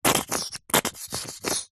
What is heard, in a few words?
food table sauce bottle squirt